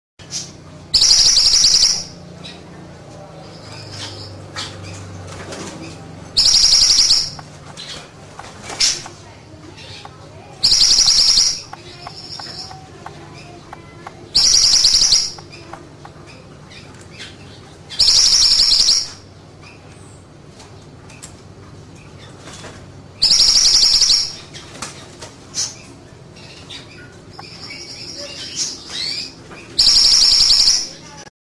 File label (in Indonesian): mantenan